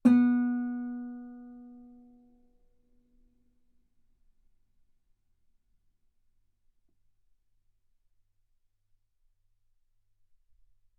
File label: Guitar B3